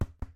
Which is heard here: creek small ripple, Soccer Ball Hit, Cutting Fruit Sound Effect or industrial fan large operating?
Soccer Ball Hit